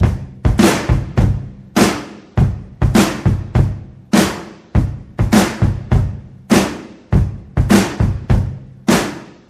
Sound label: 101 bpm drum beat g sharp key; drum loops